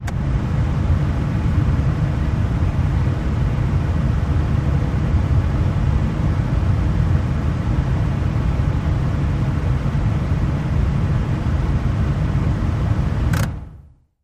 Nissan Sentra interior point of view as dashboard switches are turned on and off, and A/C operates. Vehicles, Compact Air Conditioner, Car; switches & levers